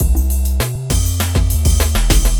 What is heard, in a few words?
Hip Hop